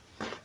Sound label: foot steps; Walking; fmod-moloch